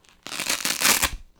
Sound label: Velcro